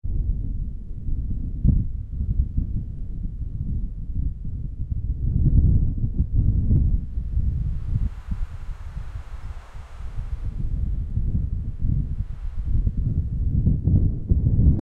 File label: sound of wind bad, bad weather